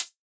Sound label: Hit Hat